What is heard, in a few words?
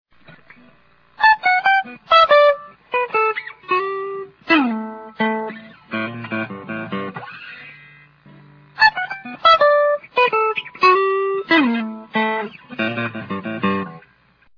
music